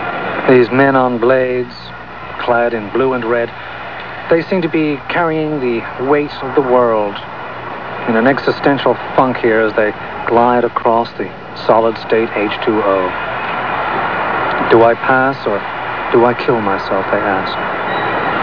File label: funk